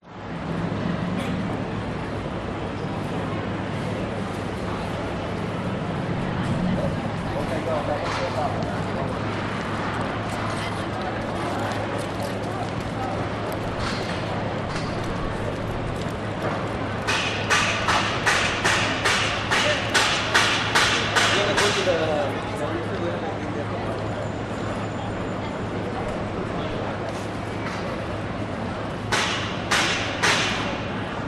public places city street amb pedestirans no traffic construction; george st sydney cbd